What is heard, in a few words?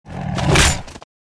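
Tiger attack Mid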